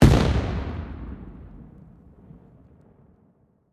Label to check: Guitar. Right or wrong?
Wrong